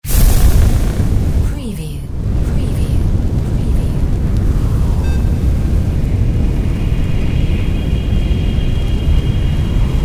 sci fi fire spell full; windy beach audio

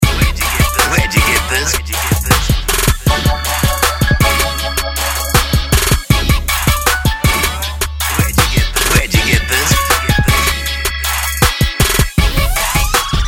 Hood Song Loop

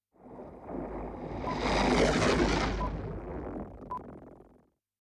Spaceship Whoosh

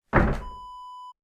water basin set down

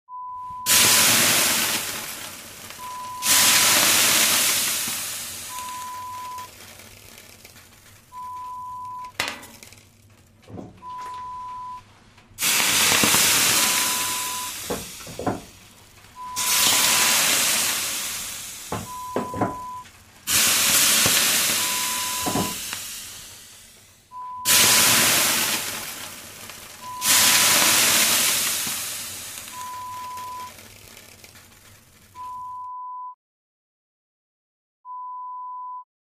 Sauna

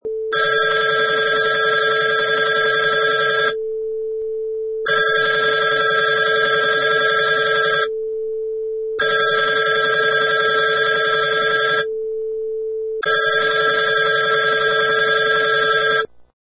Telephone Ring

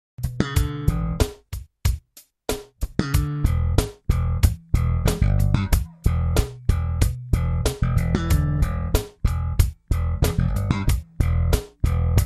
Slap line in Em

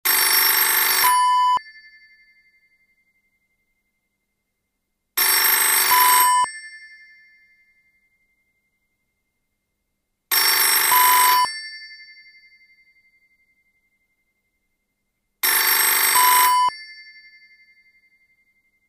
telephone rings

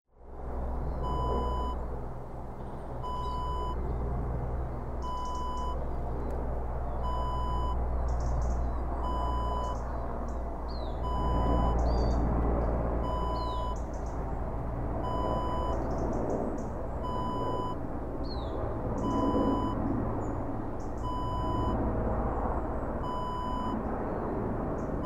plane over forest

